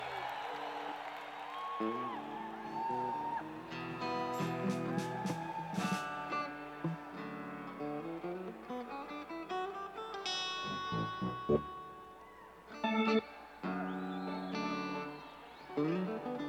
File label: Tuning